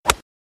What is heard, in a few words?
golf